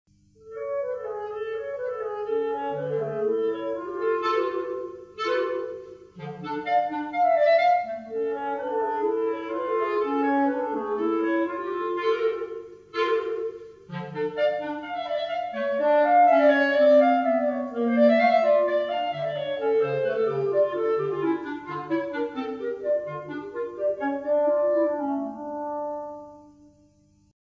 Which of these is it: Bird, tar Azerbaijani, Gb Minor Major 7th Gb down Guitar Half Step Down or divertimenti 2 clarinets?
divertimenti 2 clarinets